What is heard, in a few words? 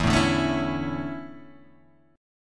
E 13th Suspended 4th; E134 Chord {0 0 0 2 2 0} strummed